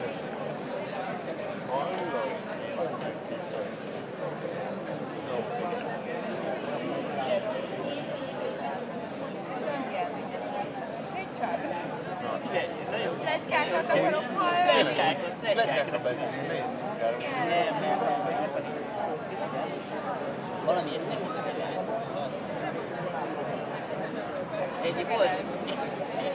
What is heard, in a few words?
Budapest at night